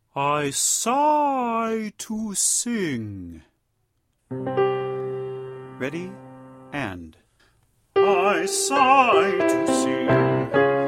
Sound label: I Sigh to Sing